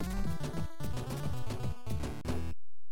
level complete; task-complete; battletoads

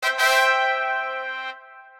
success

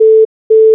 calling end

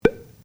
blop

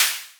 hat noiz

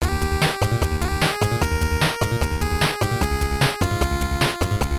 training mode; robocop

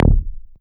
bass hit c

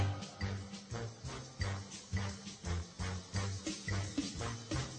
theme sting

